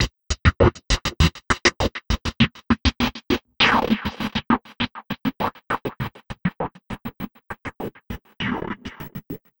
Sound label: Envelope Beat; Drums & Drones